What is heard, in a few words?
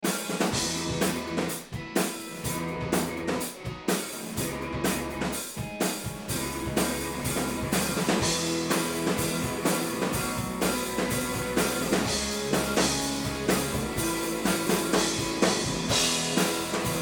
Dual Array Wet Dry Drums Room